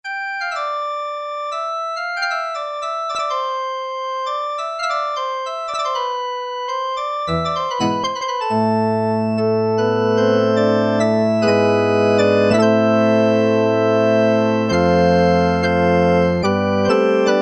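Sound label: chiff pipe, Kawai SX-240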